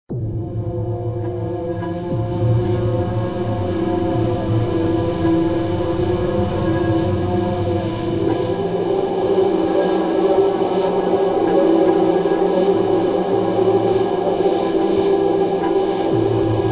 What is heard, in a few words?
Horror